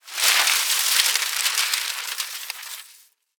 printer paper

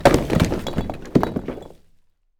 rock fall

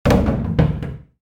plastic container drop

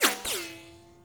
bullet double ricochet

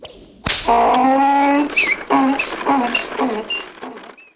camel

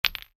stones bang

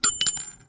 Bullet

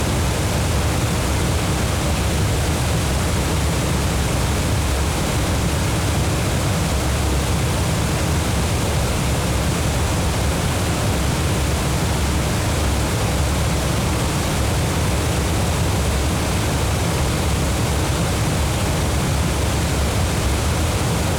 water dam flow

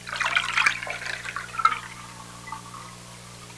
water drip

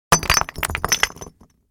rock fall

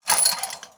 metal fence shake

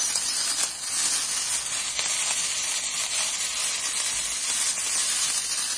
bomb fuse

